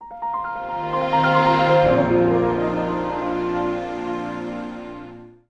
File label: song